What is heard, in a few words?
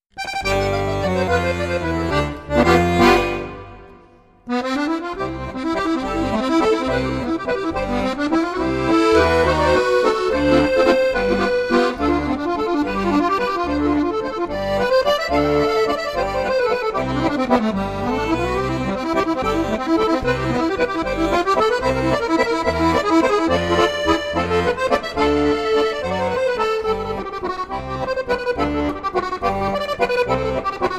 musette